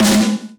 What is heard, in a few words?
snare